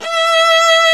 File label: ARCO VIOLA; E-MU Formula 4000 Series Vol. 5; Protozoa; Proteus2 Instrmt